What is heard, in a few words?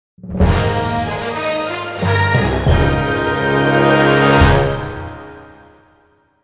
JINGLE TOWN MISSION CLEAR; sth2006